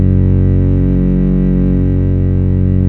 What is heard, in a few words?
PAD ZEN Pad; Best Service Gigapack 1